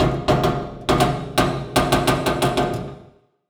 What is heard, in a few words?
metal creak